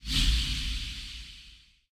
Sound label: fs Flies; hellskitchen